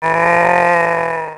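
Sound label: zombie battle, creature; GammaAge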